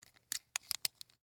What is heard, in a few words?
Sun Glasses Close, Foley, Sun, Glasses, Close; Dressing; Human